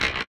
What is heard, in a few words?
Percussive Hit, contact mic; Percussive Hits; musicradar; contact mic madness